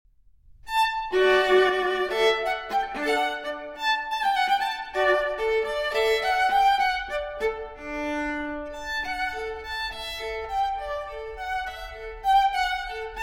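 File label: Cello Suite No. 6 in D Major Transcription For Viola Gigue; J.S. Bach; BWV 1012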